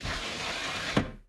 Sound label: Mi-8MTV2 Mi8 blister close, aircraft; DCS